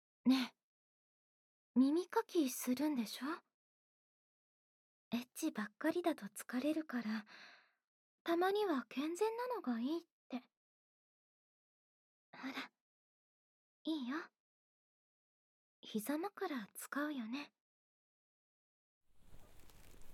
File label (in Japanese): 耳かきと耳キス, ASMR